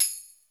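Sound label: SY77 tambourine; Crypton - DrumBase5000; SY77 DRUMS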